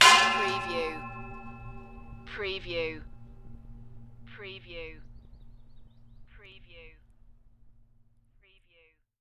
Metal Impact Hit - Gong Like With Long Ring

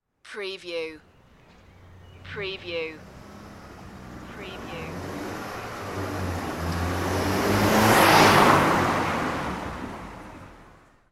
car pass and stop

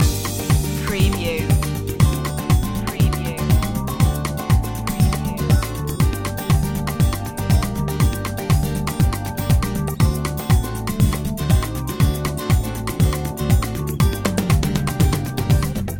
hot summer samba loop

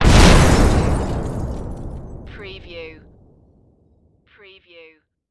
Explosion Debris; Sound Effects Bible

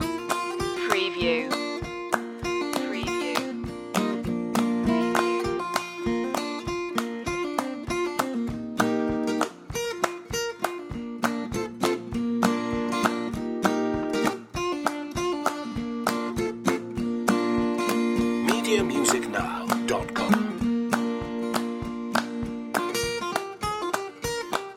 barnwood banjo loop

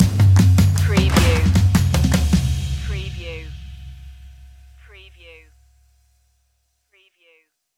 shining through stinger

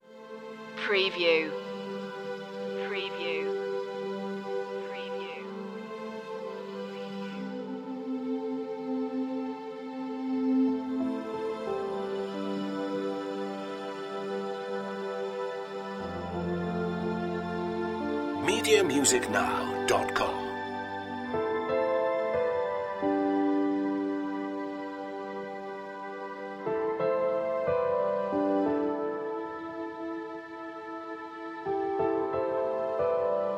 no bass

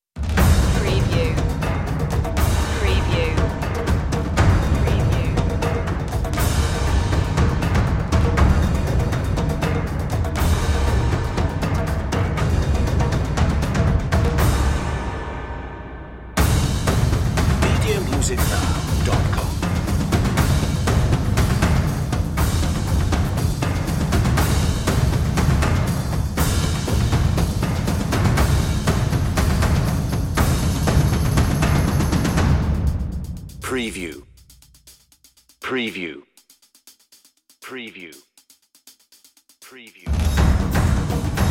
percussion

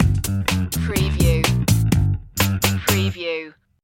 loop end bass

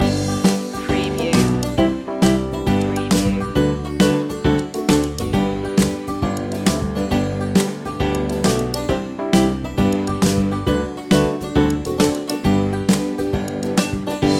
old macdonald had a farm instr loop

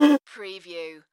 Straw Pull Up Movement

